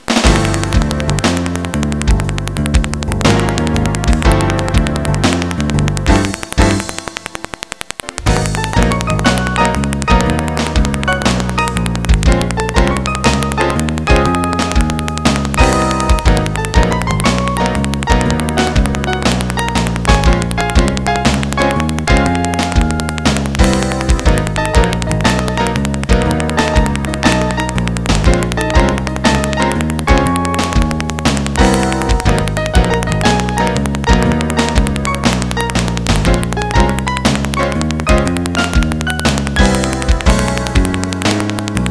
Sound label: Song, Jazz; Sound Factory